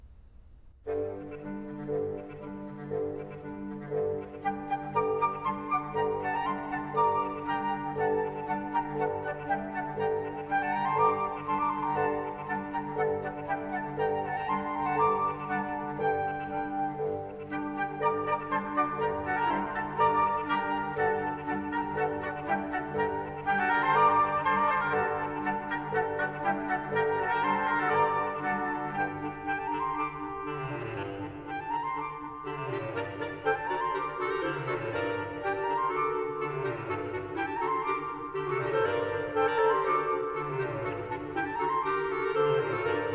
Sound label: Carols version for woodwinds; Suite of Carols; VI. Patapan; Leonard Slatkin & BBC Concert Orchestra